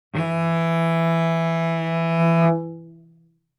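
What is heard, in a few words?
Cello arco fortissimo sul D E4; MIS Pitches - 2014; Strings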